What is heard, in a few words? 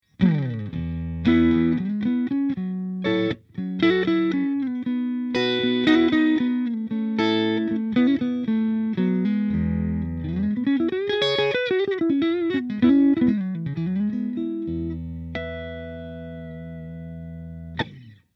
stingray neck, single coils